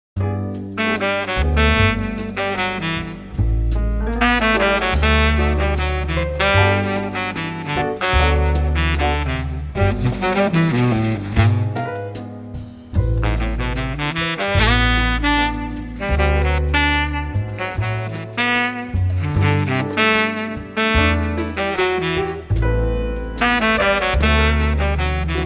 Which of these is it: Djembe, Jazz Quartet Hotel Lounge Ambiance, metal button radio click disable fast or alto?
Jazz Quartet Hotel Lounge Ambiance